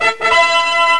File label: Trumpet Call; Curse of Dragor